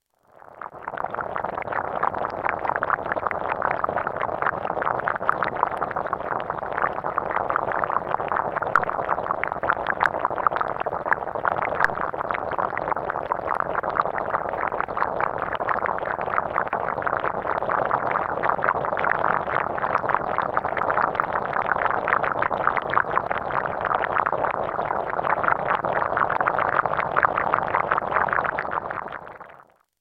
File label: fermenting seaweed; osprey